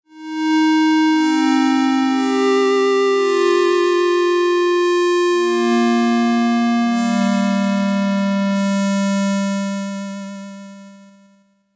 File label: Ghostly, esq1